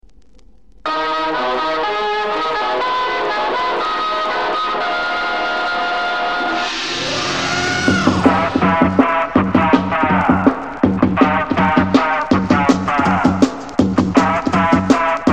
Beat Guitar

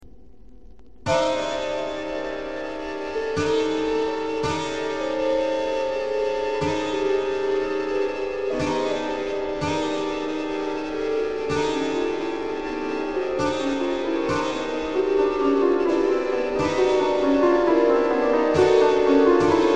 Intermezzino Pop

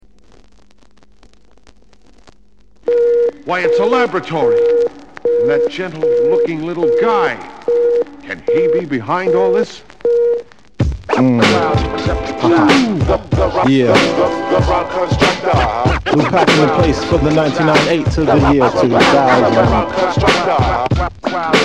Beats

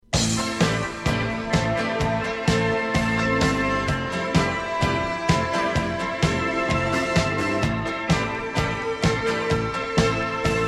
doramatic rain

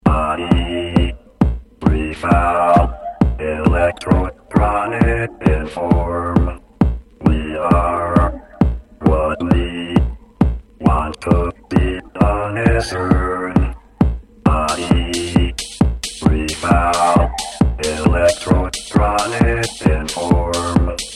body freefall electronic

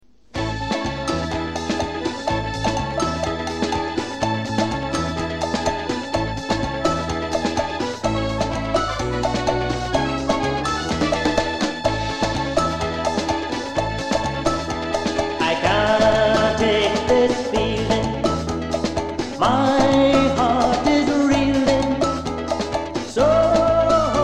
Soca Jam

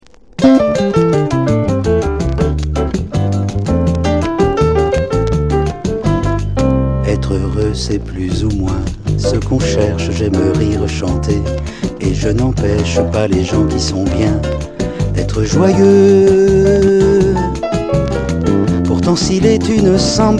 Samba

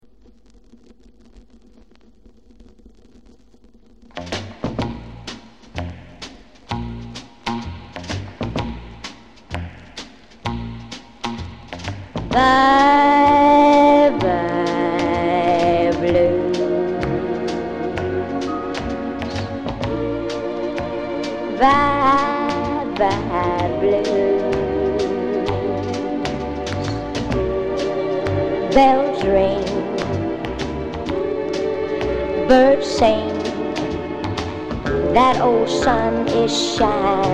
Blues

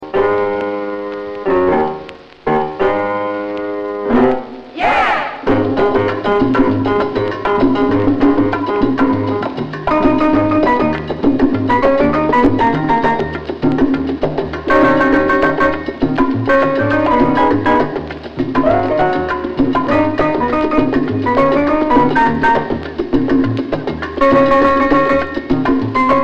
Blues Mambo

